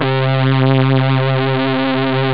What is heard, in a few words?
Elec guitar; SynthSamples2